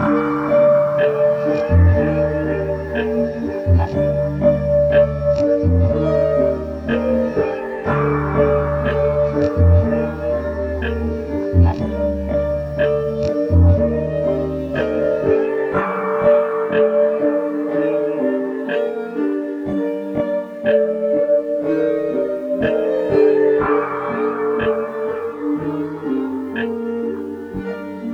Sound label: 122 BPM G Min, Trap; Melodies; Members Only